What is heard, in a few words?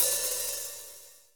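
hi hat, drums; Best Service; Real Mega Drums VOL-1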